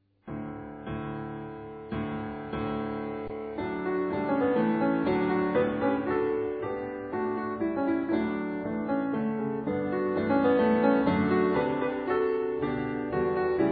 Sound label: Piano; Prillaren Fra Os Prestegjeld; grieg